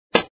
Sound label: Pop; btd4